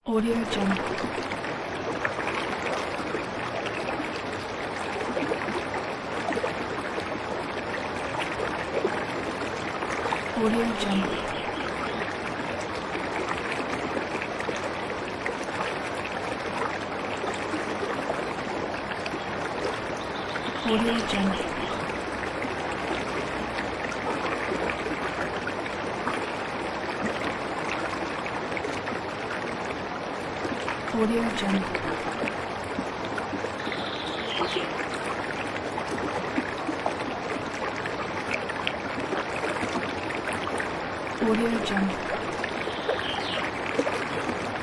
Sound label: waterfall forest